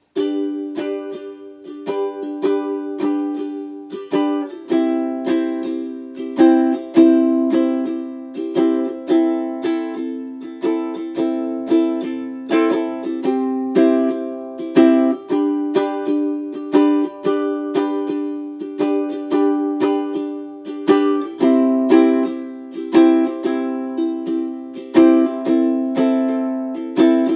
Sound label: Instrumental; Singing Competition Stage to Screen